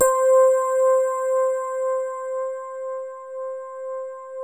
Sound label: DX Rhodes